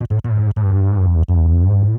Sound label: Feed chorused, Feedback FX; 99Sounds; Dark Engine